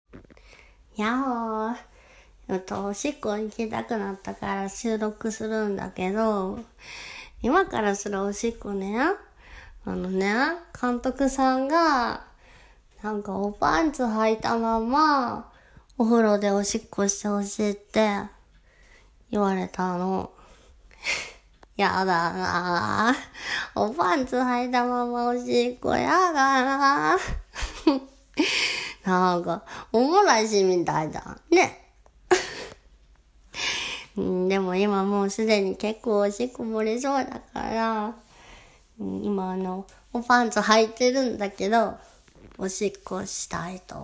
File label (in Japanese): パンツを履いたままおしっこ